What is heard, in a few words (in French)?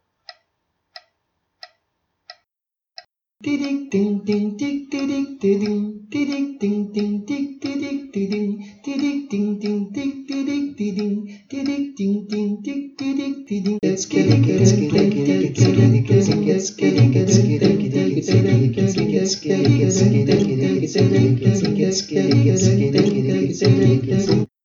Batucada vocale agogo